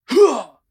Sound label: Miner Attack; Hollow Knight; Col Miner Attack